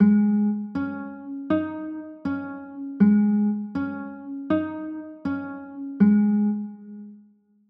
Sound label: b flat m, chords; b flat m11